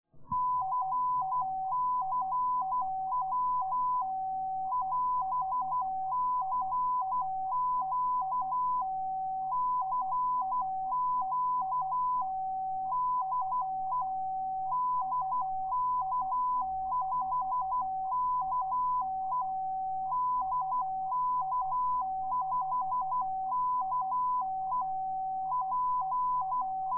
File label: CCW FSK